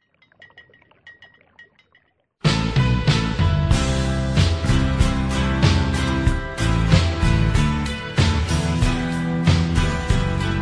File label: ooohhhhh